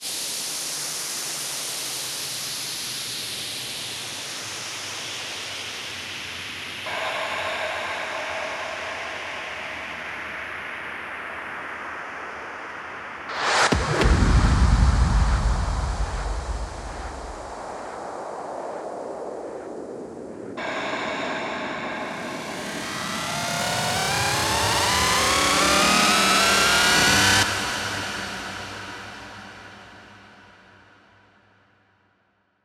140BPM FX 16 Bars; WTH; Vengeance Samples; VUF1 Snares&FX Pairs 140BPM

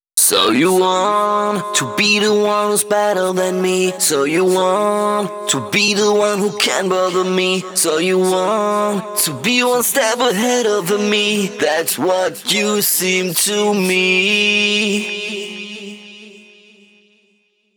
Pitched Vocals Vers1 WET, 128 BPM; Vengeance Samples; VTDS2 Song Kits

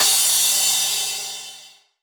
Crash; Vengeance Samples; VES1 Cymbals

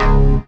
Bass C#; Offbeat Bass; Vengeance Samples

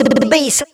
Vocals; Vengeance Samples; Special Sounds

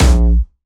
A#1, Jumpstyle Kick; Bassdrums; Jumpstyle Multis; vengeance essential club sounds-3; VEC3 Bassdrums

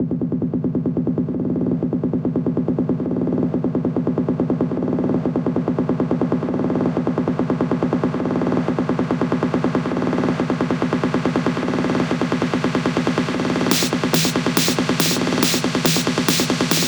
140BPM SNARE 16 Bars; Vengeance Samples; Snares FX Pairs

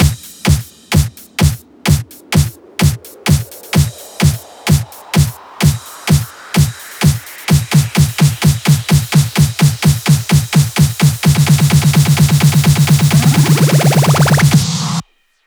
128BPM, House Electro Fills; Beatrocker 2; 8Bars; Vengeance Samples

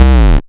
Bass C, Offbeat Bass

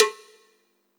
Minimal Perc; Minimal Percussion

